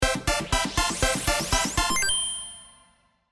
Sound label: Slot machine casino small win